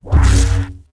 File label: saber death